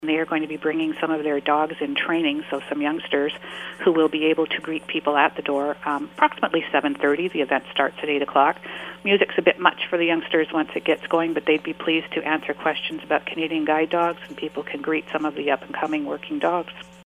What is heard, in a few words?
guide dog jazz